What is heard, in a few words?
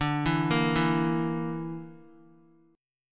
D Suspended 2nd Sharp 5th D down Guitar; arpeggiated